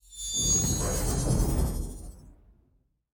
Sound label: idle orb charge; smite; olorun